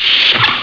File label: trek door